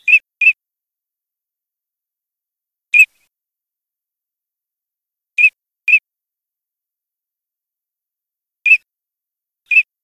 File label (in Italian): alzavola